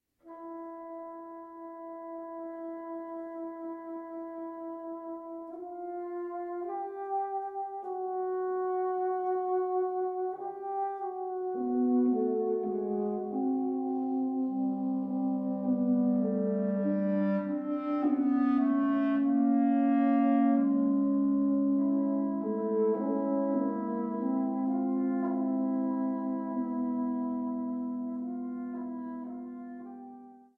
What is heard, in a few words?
Woodwind Quintet